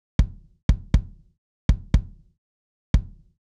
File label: Kick; hydrogen